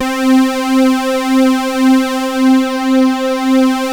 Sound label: JUN 106, BASSES; Masterbits - Vintage Voltage; VV BASSES